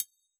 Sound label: triangle mute; Yamaha DJX; AnlgKit1